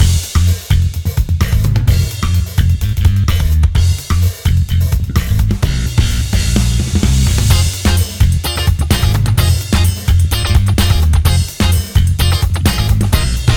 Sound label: Battle Theme; Canto II Battle Theme A1